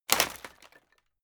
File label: wood break